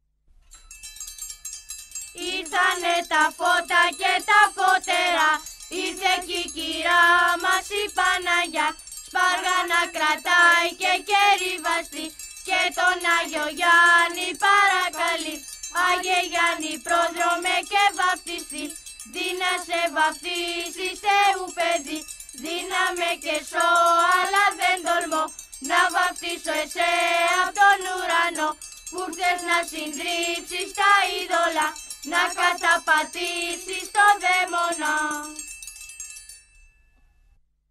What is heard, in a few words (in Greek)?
Κάλαντα Φώτων Δυτικής Μάνης Πελοπόννησος